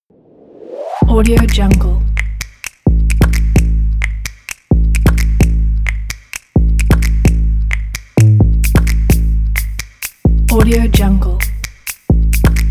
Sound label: fashion snaps rhythm